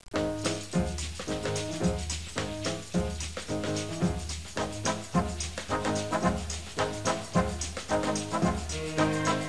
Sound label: Samba